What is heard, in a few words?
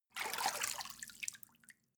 step water walk out